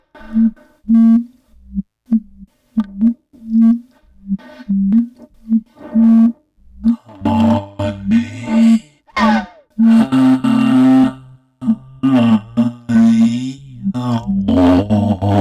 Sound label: agcmic tube sing feedback